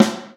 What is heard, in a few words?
SN, drum machines